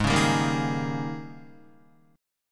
Ab Major 7th Suspended 2nd Suspended 4th Ab, down, Guitar; strummed; JGuitar